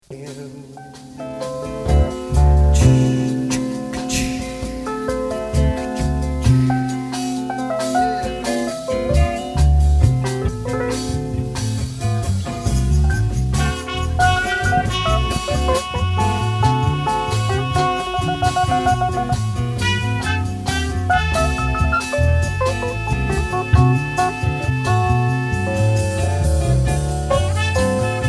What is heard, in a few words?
Deep Brass Jam Mono